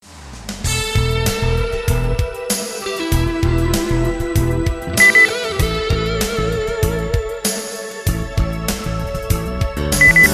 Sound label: rock